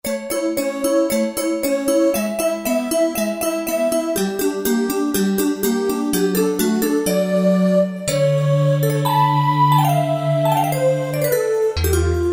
Voice Bell, Roland JD-990; Factory Patches

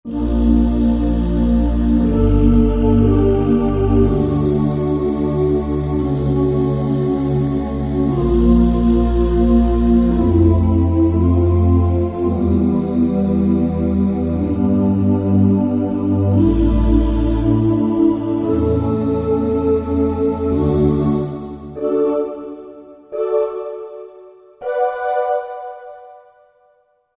Heavenly Voices, Korg TR-Rack

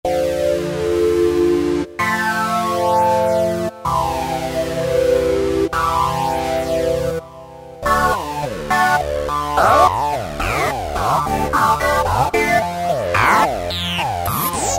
Roland V-Synth Feedback Osc; Factory Patches

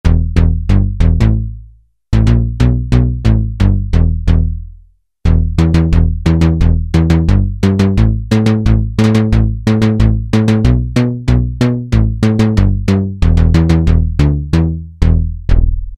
Synth Bass I unison, Roland Juno-106; Factory Preset

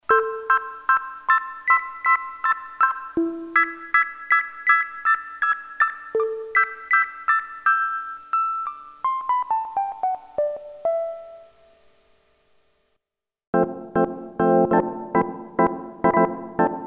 HARMONIC PERCUSSION, Roland Jupiter-8; Factory patches

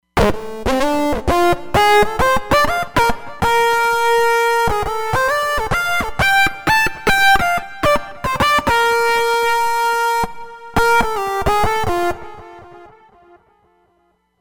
Body Electric, Roland Fantom XR

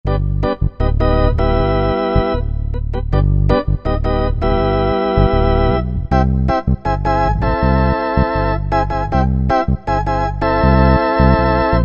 Bass Fifty, Korg MSC-9S Organ

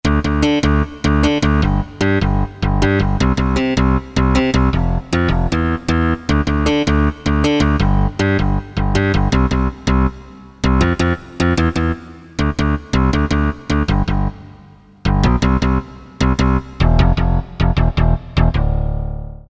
SYNBASS, Korg DSS-1

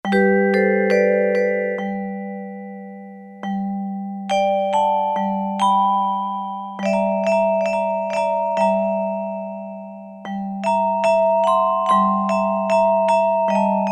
Vibraphone, Roland U-220; Factory Patches

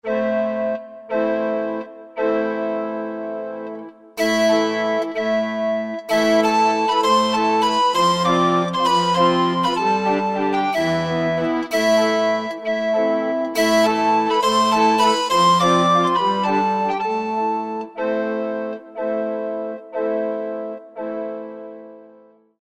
Medieval Ens

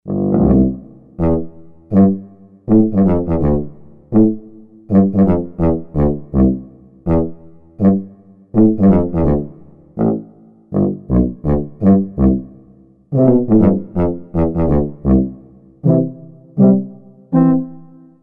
Tuba, Korg TR-Rack

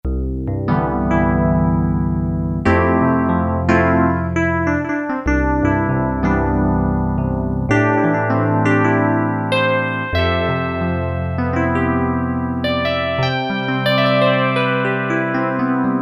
ELEC. PNO, Korg DSS-1

